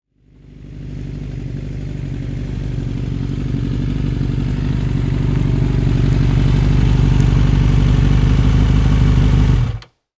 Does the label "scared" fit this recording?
No